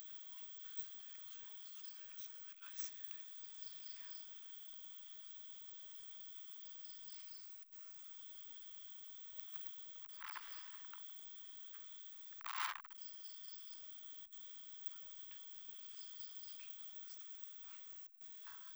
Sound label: Rhinolophus trifoliatus 20kHz HP